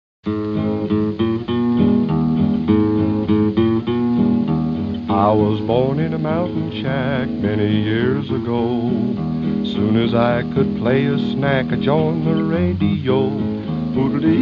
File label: yodeling